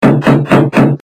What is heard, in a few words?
door